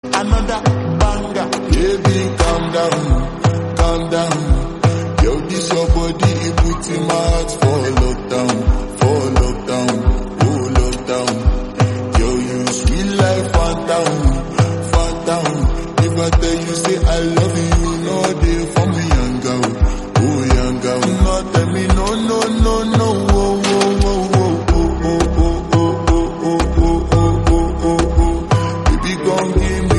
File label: slowed and reverb ringtone